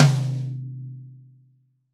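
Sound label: Reg. Mid Tom, Roland BK-5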